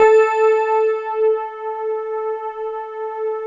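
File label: GLASS Rhodes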